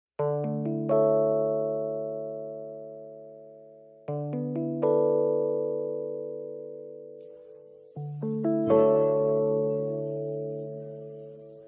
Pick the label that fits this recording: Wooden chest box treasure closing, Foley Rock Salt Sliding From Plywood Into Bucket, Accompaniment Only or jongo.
Accompaniment Only